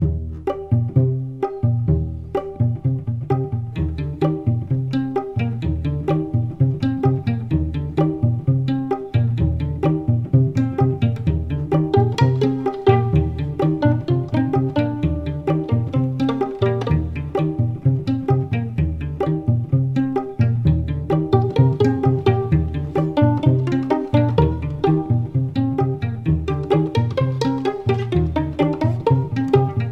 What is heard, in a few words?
BIG STORE STRINGS